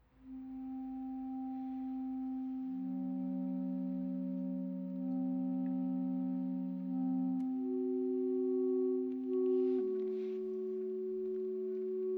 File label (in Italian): Trio Clarinetti